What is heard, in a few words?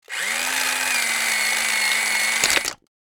RC toy car remote controlled impact; rc toys